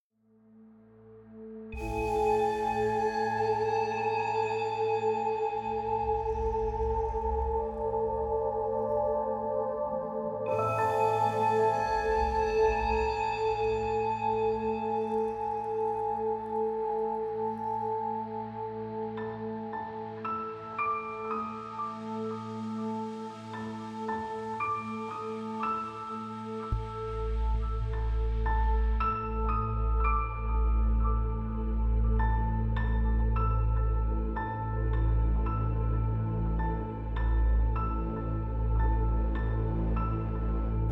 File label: Whispering Forest; Afterimage